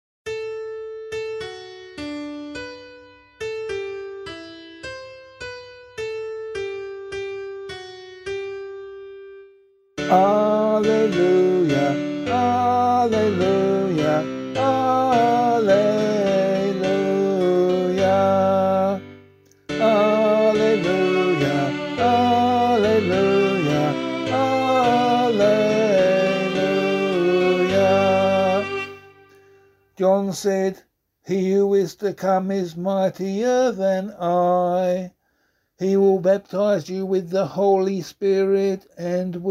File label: Gospel, vocal